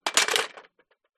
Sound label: vehicles scooter childs metal fall over; zapsplat